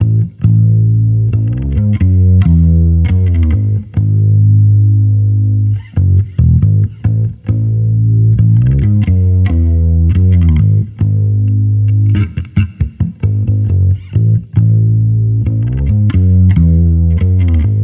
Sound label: Amped loop 68 C#m, Electric Bass Loops; Slate Digital BASSHOLE Sample Pack